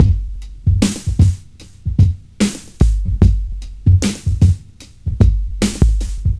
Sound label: lazy and noisy, 75bpm